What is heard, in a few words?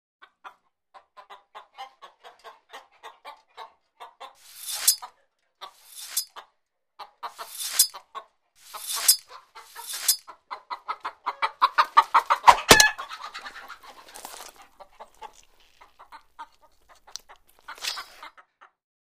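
chickens in chicken coop knife sharpening slaughtered chicken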